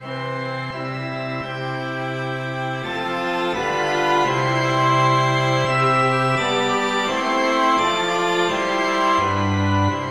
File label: polyinstru; hymns; Himnario grande